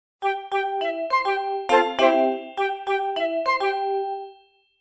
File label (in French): SONNERIE; pySonnerie